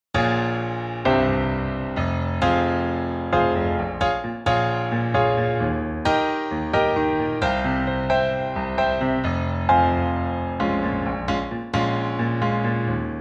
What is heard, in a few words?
english karaoke